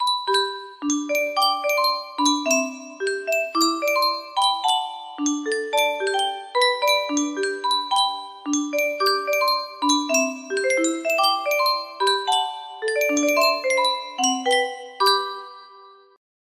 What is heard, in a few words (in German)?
yunsheng spieluhr, music box melody